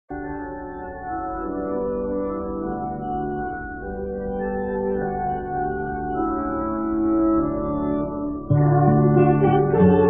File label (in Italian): con parole strofe 1 e 2 Alleluia Taizè